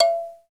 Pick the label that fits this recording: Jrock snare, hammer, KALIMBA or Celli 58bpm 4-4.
KALIMBA